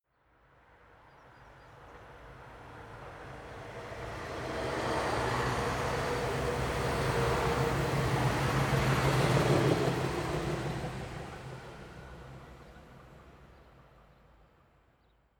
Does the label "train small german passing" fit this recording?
Yes